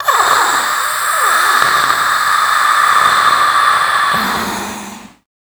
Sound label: VOICE FX, Dance Vocals